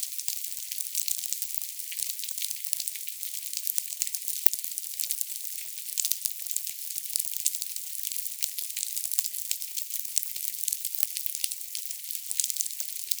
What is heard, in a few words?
snapping Shrimp Clean; wilderness songs